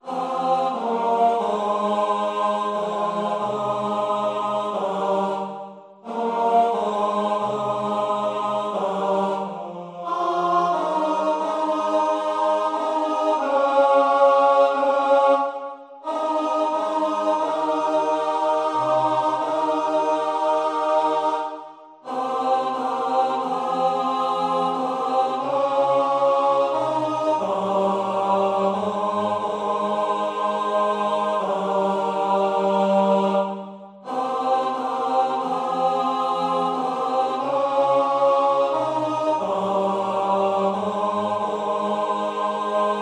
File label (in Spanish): Tenor resaltado